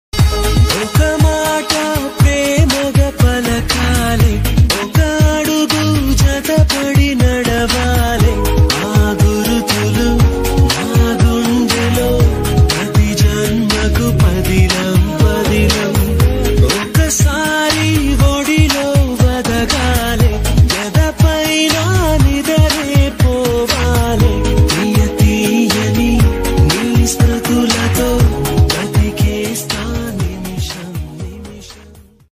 movie love song

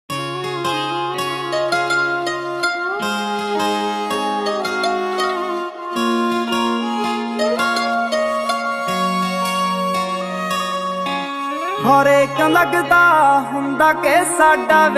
punjabi sad song

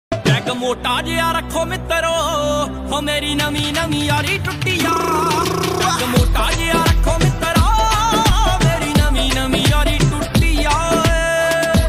punjabi song ringtone

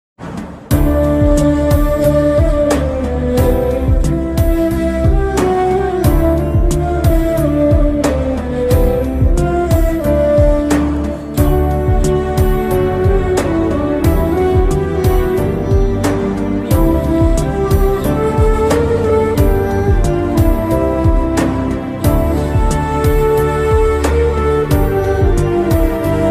bhakti ringtone bhajan; shree ram ringtone

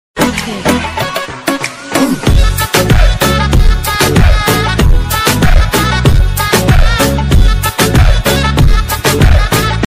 english ringtones bgm ringtone

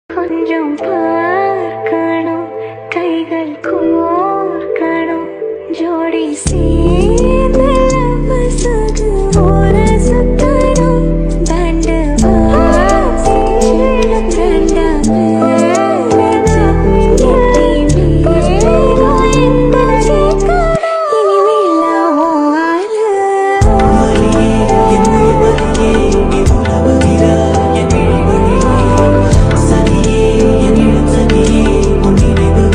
tamil love ringtone remix

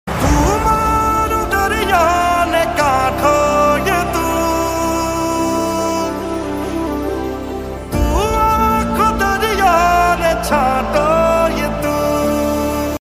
gujarati song ringtone; samandar gujarati movie; motivation

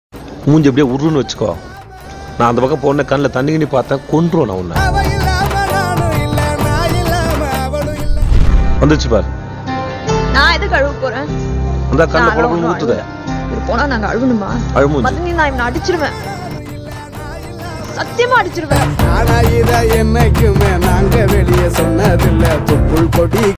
tamil bgm

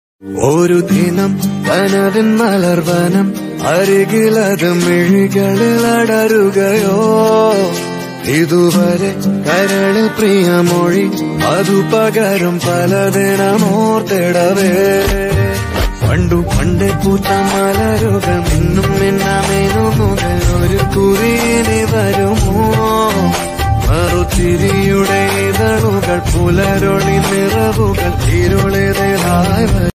nature ringtone; kerala; onam ringtone

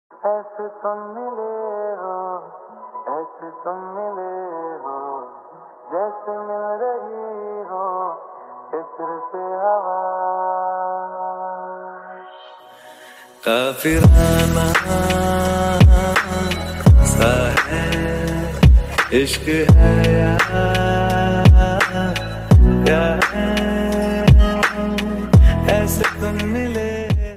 lofi slowed reverb ringtone

